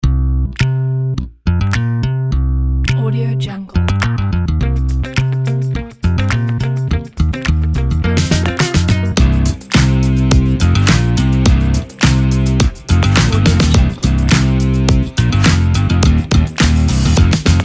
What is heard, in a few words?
upbeat energetic commercial indie funk